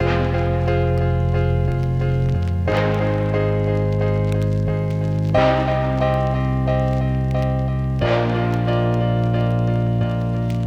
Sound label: Classic Melody Loop, 90 BPM C Min; Melodics; Cymatics - Eternity Sample Pack